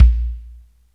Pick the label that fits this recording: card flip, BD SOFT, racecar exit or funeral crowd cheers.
BD SOFT